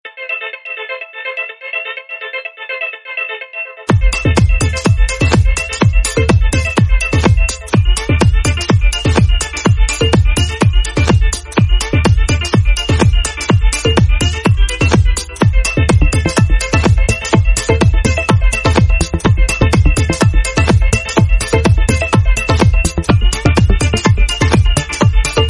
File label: TECH HOUSE RINGTONE